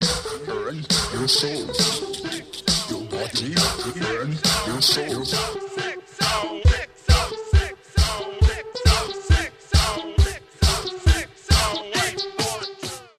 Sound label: Hard House